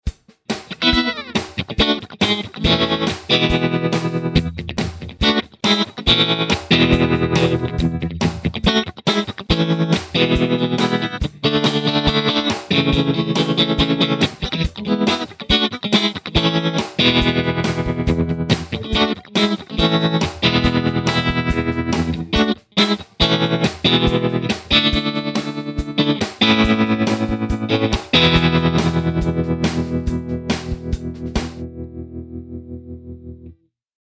trem double time, tremolo; diamond pedals

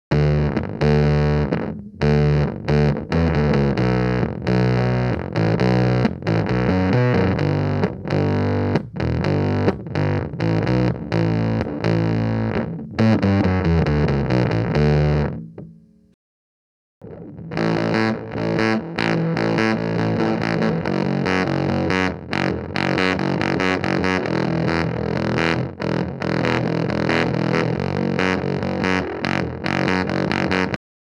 bass mode, fuzz

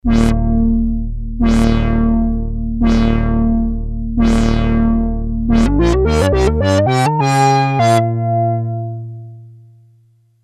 basic lp reso; subconscious communications model53; reso 60% single lp; filtering

